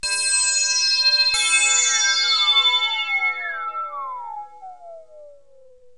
bell, Roland JX3P; Drums

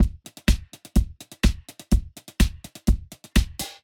125, LOOPS DRUMS; Tanooki; RoBKTA Sample Pack Supreme

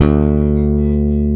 D2, Bass Electric, AKAI S-1000; Retro Sampler

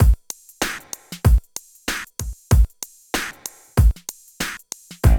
DRUM LP; East West - Phat & Phunky

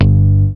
D.B. Bass One Shot (D); Basses

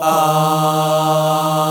D#2, Basic Pop Ahhs

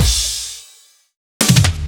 Fills 128BPM, Clubsounds

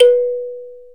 Thumb Piano B3; Planet Earth; Digital Sound Factory Planet Earth

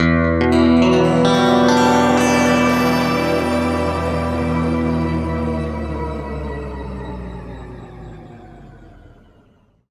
E, guitar chords; East West; Steve Stevens Guitar Samples Collection

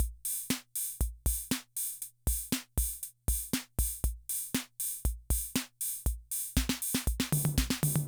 Loop, rhythm 55, classic machines

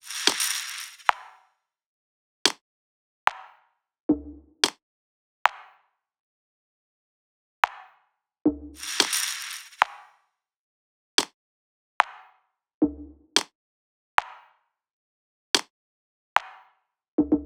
loop perc 110; Seismic Loops

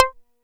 JUNO SEQ; E-MU Formula 4000 Series Vol. 3; Analog Odyssey

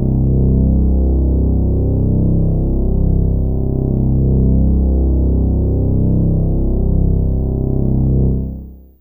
Synbass C2; Fairlight CMI IIe; Basses

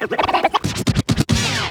Vocal Scratches 140BPM; Vengeance - Essential Clubsounds Vol.4

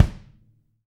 BD, DR BD; Best Service Gigapack 2

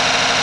FIRING, Anime Sound FX, SCIFI

